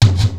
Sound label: REVERSE DRUM; Rubber Chicken Software Sample Bank; DRUMS + PERC